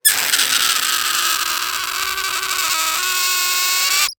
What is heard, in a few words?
Cartoon Balloon Release Air Squeal; AdobeCC